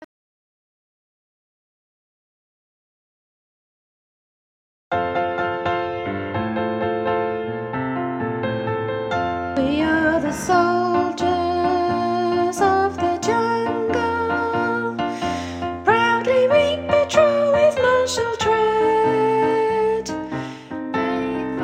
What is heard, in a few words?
piano